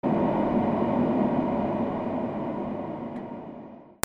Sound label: HOUSE